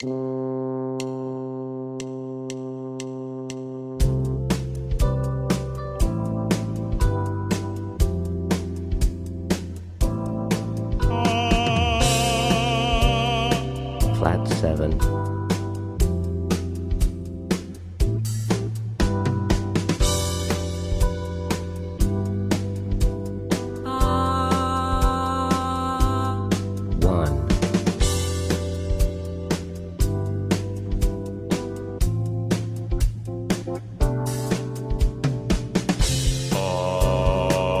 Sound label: Slash Chords Funk; slash chord ear training; Practice Perfect